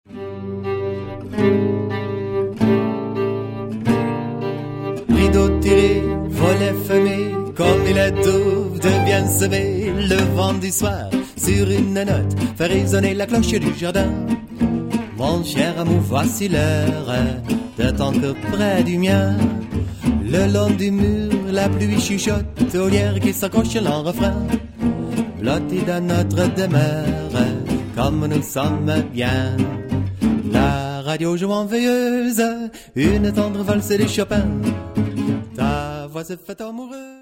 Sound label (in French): Douce ambiance; django chante